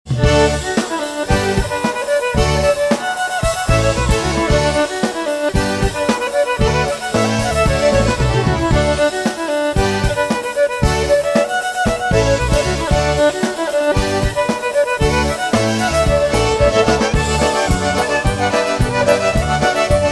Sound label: Lightning Strikes Twice Polka Live Jam